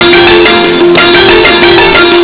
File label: duet